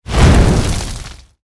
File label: Rock Throw FLAME; Evolve; Meteor Goliath